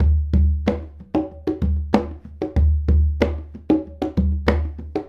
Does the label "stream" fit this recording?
No